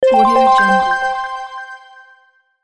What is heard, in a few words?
success